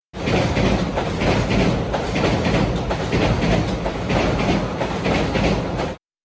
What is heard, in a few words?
train